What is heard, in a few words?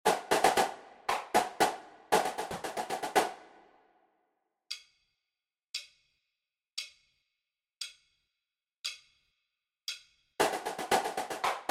cadence snare